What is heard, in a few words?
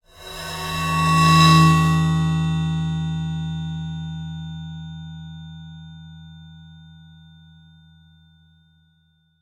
bowed cymbal 13 hh highs mids lows; fienup; sonniss gdc